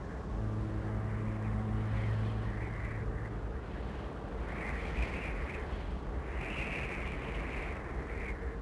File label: wind, ambience; Star Wars Obi Wan; XBOX; coruscant